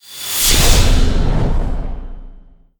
soulsword cast

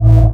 hum; snds jack gmod

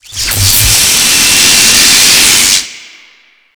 lightning; thor

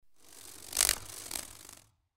mantis wing; npc

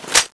clip in; cso; x-15

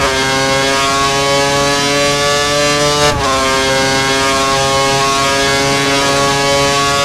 fourth cruise, vehicles cars; f1; lwcars

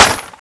clip in; tfa cso; aeolis

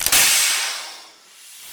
pile reload

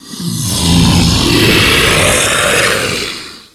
death

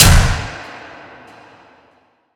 arena lights on

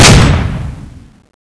fire, weapons; tfa cso

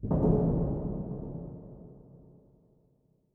metal hit far; soundscapes; occultists

